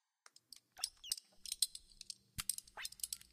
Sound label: gadget; Secret Agent